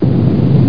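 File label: wall fall; dosgames; roc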